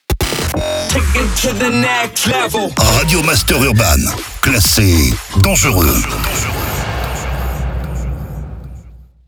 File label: JINGLE RADIO; RADIO MASTER URBAN; Habillage